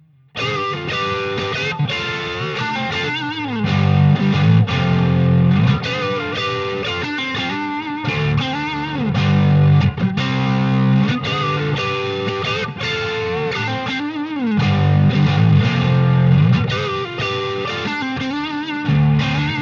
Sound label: dust slo od